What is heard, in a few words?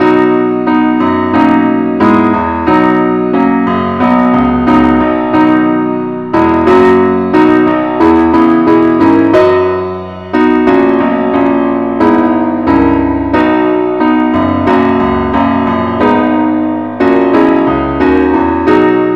keyboard only, key b; christe Sanctorum